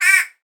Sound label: white bellied go away bird cry